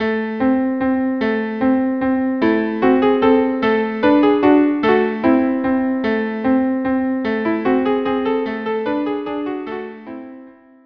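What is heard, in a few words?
Vals viola cello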